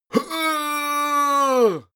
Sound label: male old attack; menschen; schreie